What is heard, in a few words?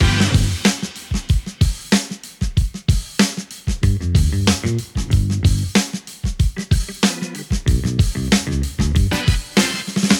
Funk